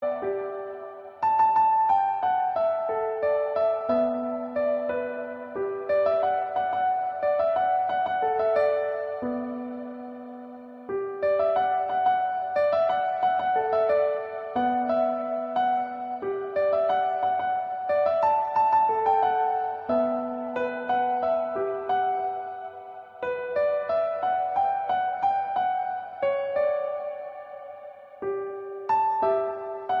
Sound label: piano